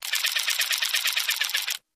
Motor, Small; Electric Toothbrush, Slow Constant, Slow To Stop